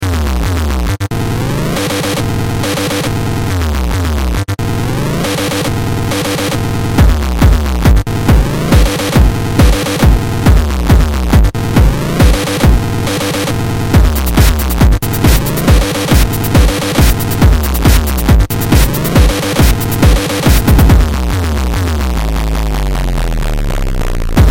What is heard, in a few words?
Synth Glitch Harvester; Metalthrix; QiTaNo